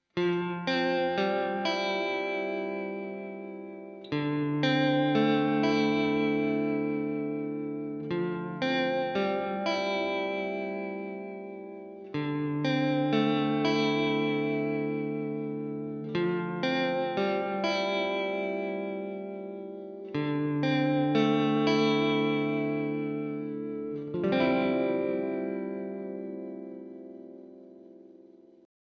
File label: Vibrato, modulation; modulation pedals